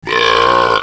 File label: BURP A#; Beavis and Butt-head in Virtual Stupidity